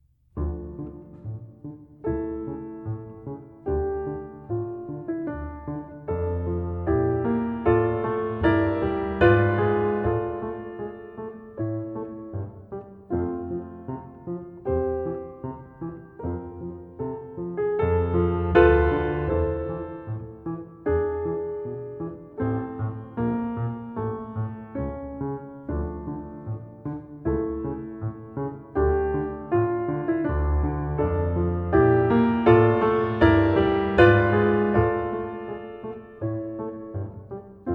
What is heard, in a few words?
Piano Sonata in D minor Andante; No. 15; Op.28; Pastorale; L.V. Beethoven